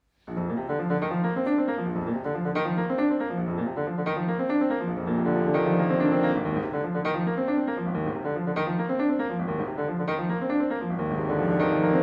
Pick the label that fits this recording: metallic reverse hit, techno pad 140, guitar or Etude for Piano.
Etude for Piano